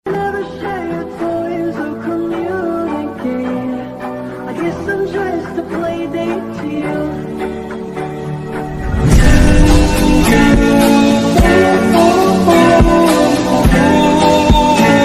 Aesthetic Anime Ringtone

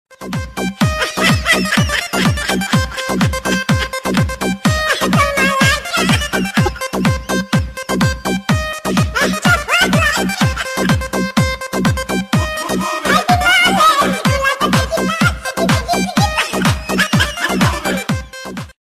Baby Remix Ringtone

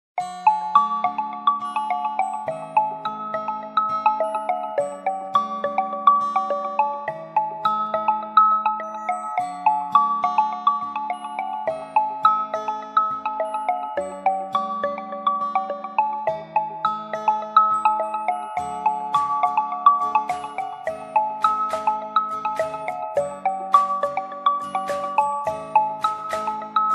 Xiaomi Redmi 12 5G Ringtone

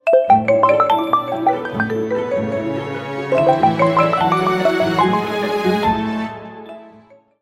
Huawei Ringtone

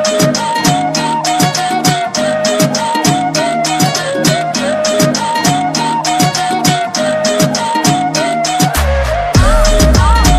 Punjabi Instrumental Ringtone

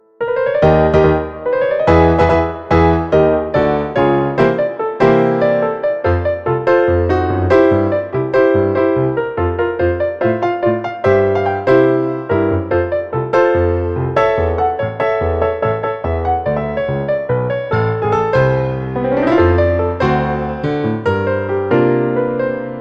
Ringtone; Redmi 8 Xiaomi

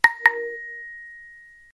Ringtone SMS Nokia Classic

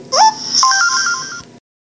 Samsung S20 Ultra Sms Ringtone

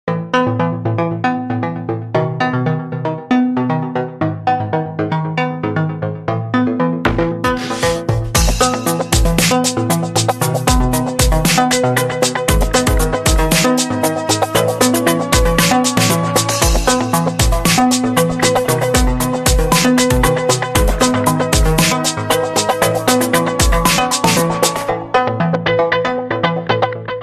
Oppo A1 Pro Official Ringtone

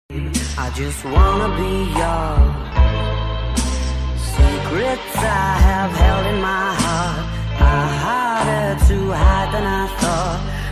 Soft Aesthetic Ringtone

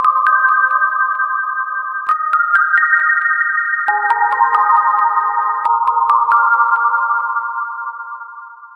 huawei ringtone

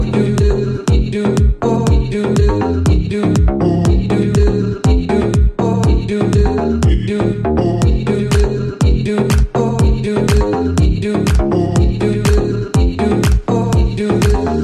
Electro Dj Ringtone

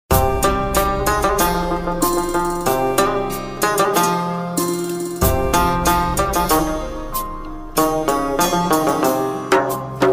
Music Background Ringtone

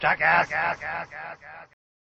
Notification Ringtone

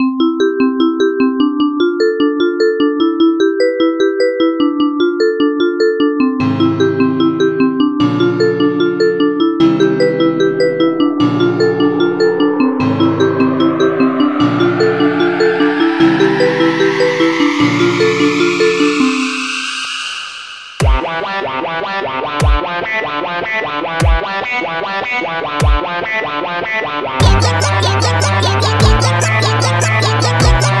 Xiaomi Original Botic Mix Ringtone

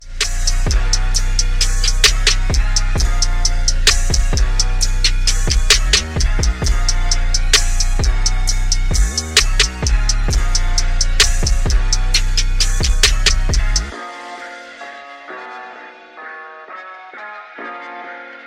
Music Ringtone

